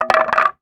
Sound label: Wood 2x4; 1001 Sound Effects